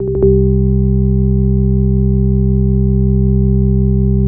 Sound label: C2, ORGANS; House Side Of 2 Step; USB Soundscan vol.51; AKAI